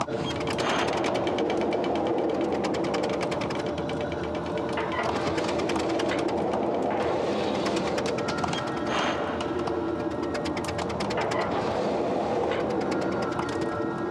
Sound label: foundry; Simon Brodtmann